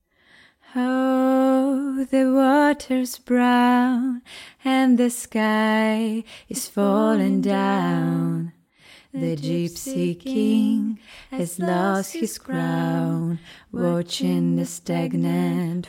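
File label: pop 72 C major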